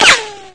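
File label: ricochet; weapons; svencoop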